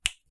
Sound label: finger snap, small room